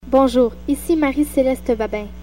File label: Jingle